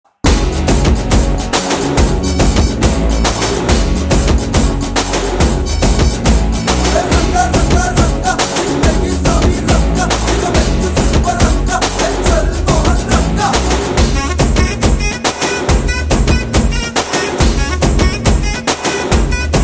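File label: background music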